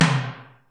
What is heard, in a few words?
tom, Kurzweil K2000